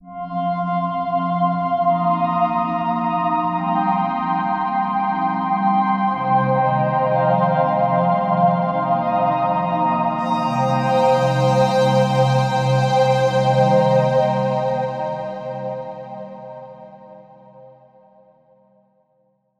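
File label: 140 F Synth Pad Loop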